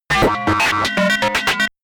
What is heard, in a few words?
calculator, comedy cartoon robot; soundpool